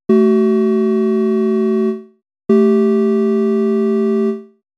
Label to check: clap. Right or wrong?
Wrong